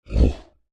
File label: Creature Large Grunt Effort Huff; Monsters; Horror; Big Room Sound